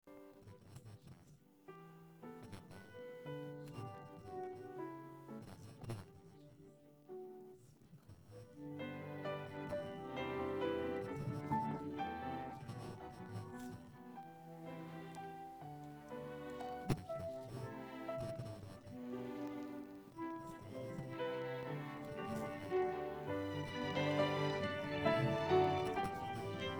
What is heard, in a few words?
no vocals